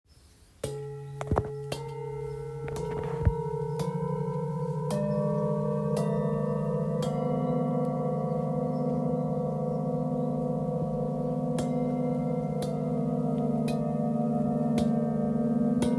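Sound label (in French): Set thick, bols chantants tibétains; attraction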